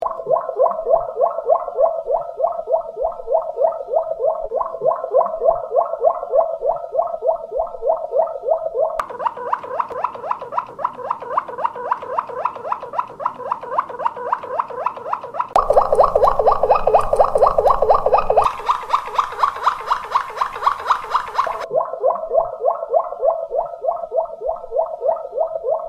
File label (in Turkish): klokkende, Malinua Kanaryası, Kuş, adeta çan sesi çıkarıyormuş gibi kısa ve net tonlarda öter; Bu nağme, yarışmalarda en çok aranan melodilerden biridir